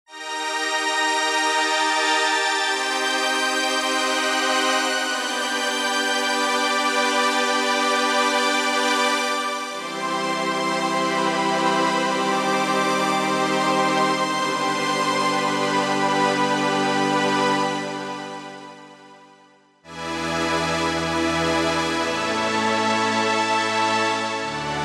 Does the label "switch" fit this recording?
No